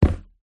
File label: foley footstep single wood loose slight rattle boot; zapsplat